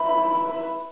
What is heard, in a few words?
Menu Popup; Desktop Themes